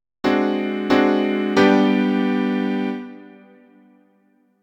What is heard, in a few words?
French sixth moving to V